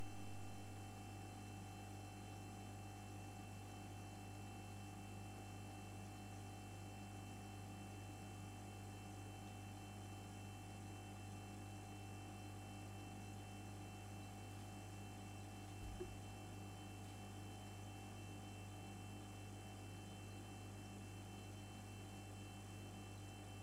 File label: Fridge Tone